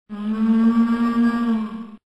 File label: Monster SFX